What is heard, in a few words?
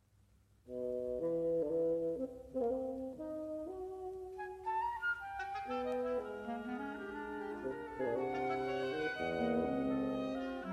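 Wind Quintet Allegro giocoso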